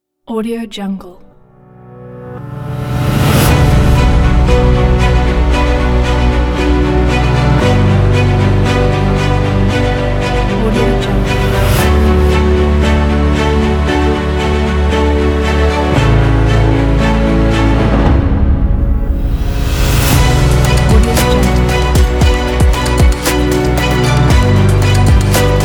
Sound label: the abstract pack